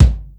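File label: bass drum funk; 300 Drum Machines; Yamaha Motif ES-Series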